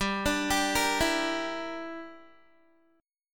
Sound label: G Minor 6th, Guitar; Gm6 arpeggiated; 15,17,17,15,16,x